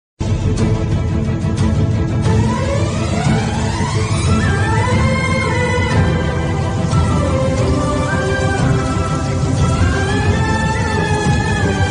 bgm ringtone love bgm ringtone sad emotional song ringtone